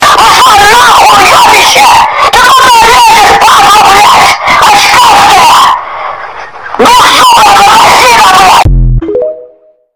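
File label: rain sound; zvuk dozhdia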